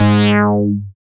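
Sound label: BAS MKS-50, bass, Roland; Sound & Vision - Gigapack II CD 1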